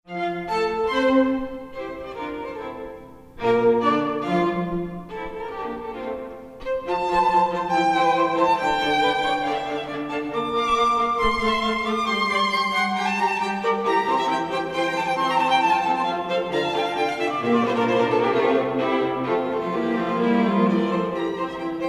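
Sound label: String Quartet mozart divertimento in f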